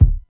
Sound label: tight lows kick drum single shot c sharp key